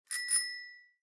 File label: Single Clang, notifications; Lenovo Tab3 Essential TB3-710I